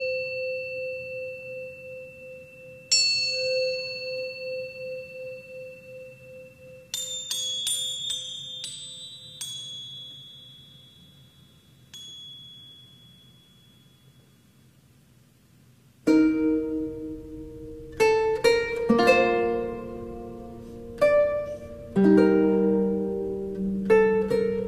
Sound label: Quiet Night Thoughts(Clarinet, Perc.,Lu; Clarinet, Perc.,Lute, Cello